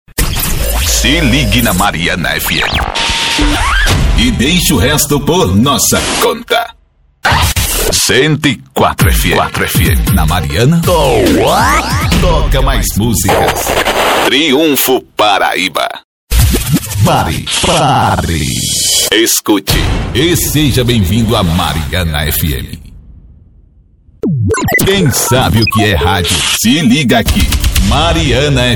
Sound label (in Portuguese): vinhetas